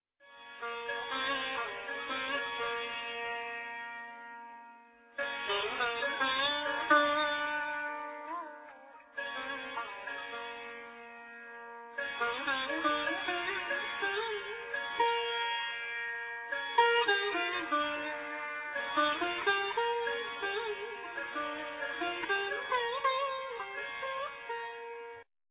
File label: sitar